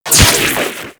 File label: Microwave Pistol Shot; Warframe; Grineer